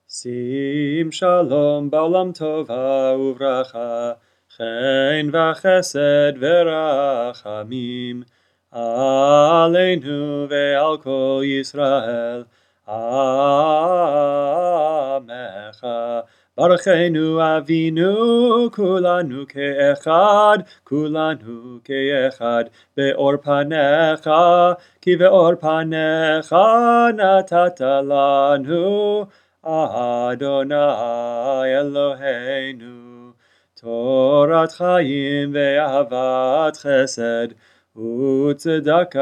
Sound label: sim shalom alternate melody; shabbat morning service